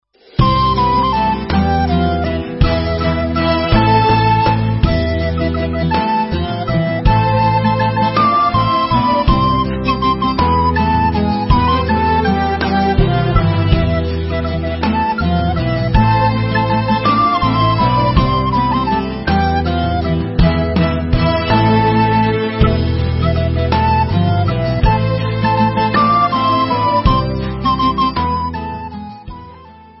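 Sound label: instrumental, Israeli Dances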